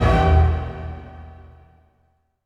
HIT, Orchestral; Orchestral Loops; 128 MANHATTAN

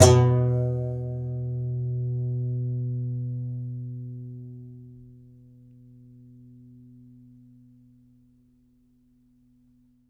C. Bass Balalaika, Plucked Strings

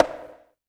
CONGA, CONGAS; Best Service; Dance Mega Drums CD2

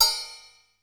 XE8 CUP; Crypton - DrumBase5000

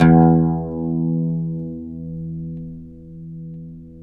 OUD E; Guitars; Spectrasonics; Hans Zimmer Guitars VOL-2

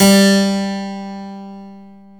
KEY HRPCR, Roland; Roland - LA Composer vol. 1

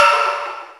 Ambi Jar 2, Fairlight CMI III

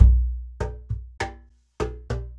Fat Box, percussion; Sonic Foundry (Sony Creative Software); Joe Vitale Latin Percussion

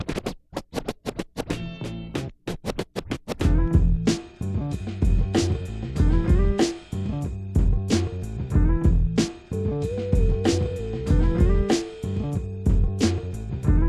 94BPM, Hip Hop; InVision Interactive; 40 Oz Phat Slammin

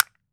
CASTANETS; AKAI S6000 CD-ROM - Volume 5

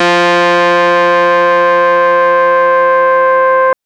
Synth FM 2 Op Basic 3 c3

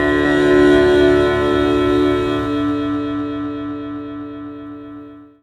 Sitar

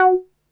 NASTY B, BASSES

